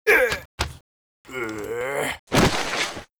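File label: war die, Mob; vafferbarbar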